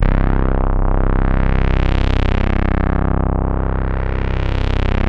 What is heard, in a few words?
PULZE SWEEP BASS; Masterbits; Vintage Voltage